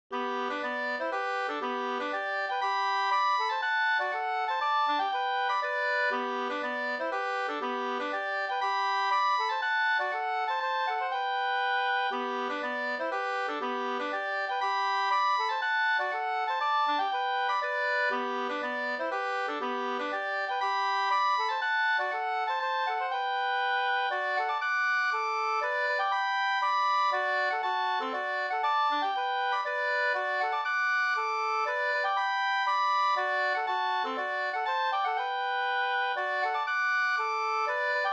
polska, 2st; e Gustaf Johansson Olstorp Floda

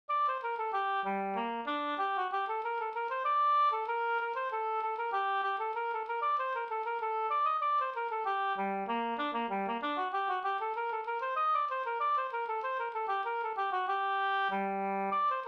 slangpolska polonese

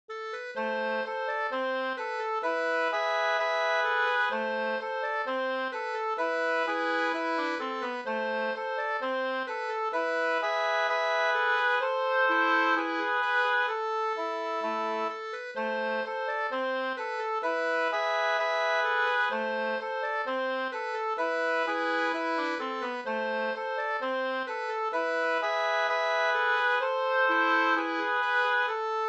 ganglat Fabodlat; Lillhardal Hj

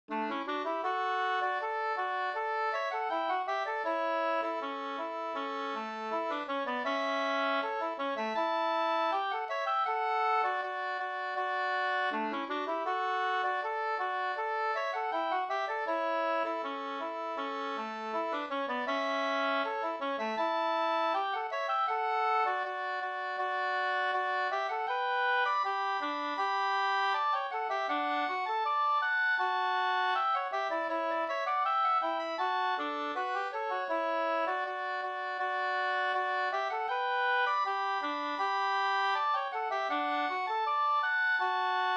brudmarsch; Prastmarschen

